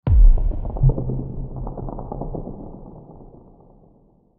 Hit Crackle, Hit Crackle Deep; Production Element Imaging Element Accent Transition; Blastwave

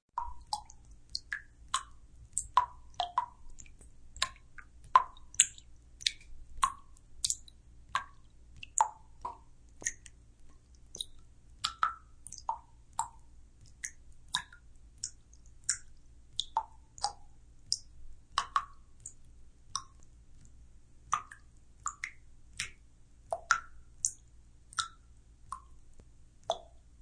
Series of water drips; Dripping (Sound Effects:Objects)

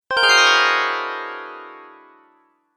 Wrong Answer, dong sound effects; multimedia

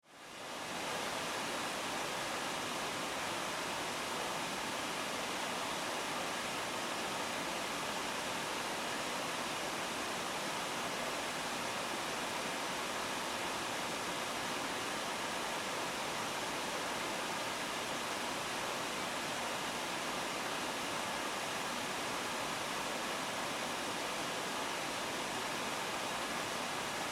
Household Microwave Fan High; Appliances (Sound Effects:Household)

